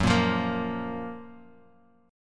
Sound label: F# Major 7th